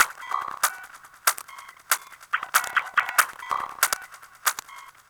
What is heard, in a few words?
Glitched, Processed Percussion